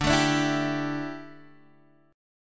D Suspended 2nd Suspended 4th D down Guitar Open D